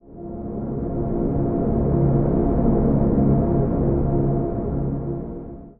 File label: drama env